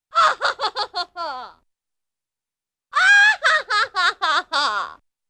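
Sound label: Woman Laughs with short 'haw-haw'; Cartoon; Hollywood Edge; Cartoon Trax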